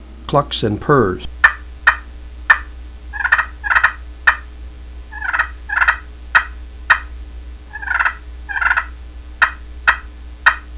slate walnut clucks purrs, turkey hunting

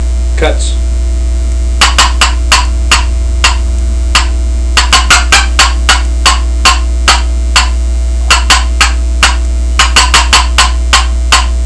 old timer cutts, turkey; Southland game calls

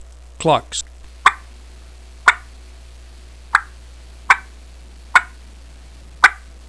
cuttin hook clucks, calls mouth double; primos

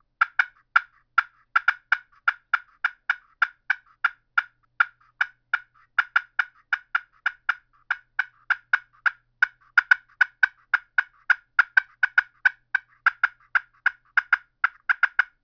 waterproof yellow heart with closer cutts, turkey; Grand Slam

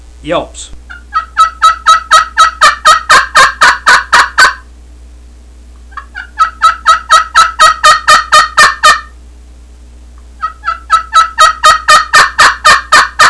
yelps, turkey calls friction; supersound 2000; millrose; canecreek

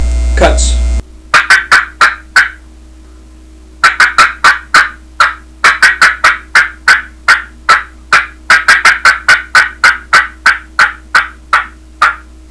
cutts, turkey; dixie

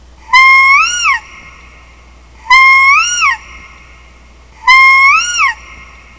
peacock; primos

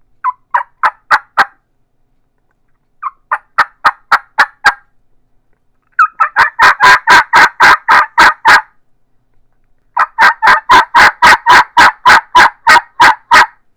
batwing III yelps, turkey; Southland Sounds

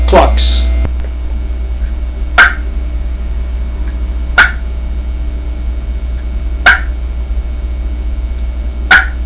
challenger inverted v clucks, turkey; Southland Sounds

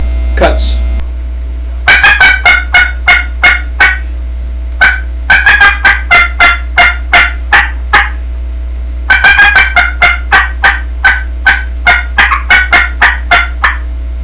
spring delight cutts, turkey; Southland

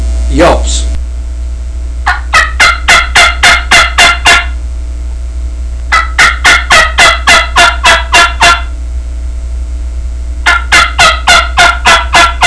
spring delight yelps, turkey; Southland

